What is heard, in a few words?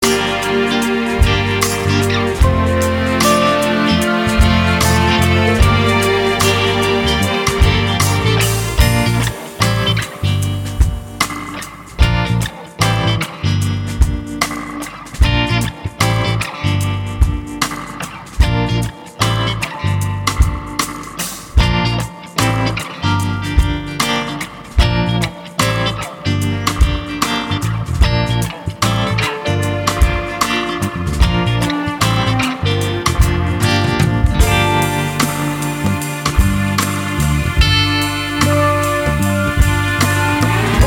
instrumental